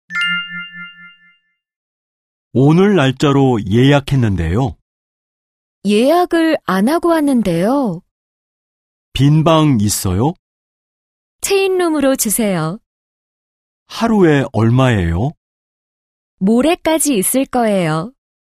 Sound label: K-POP